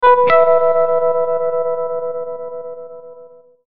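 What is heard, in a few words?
Musical Element, Drum Snare March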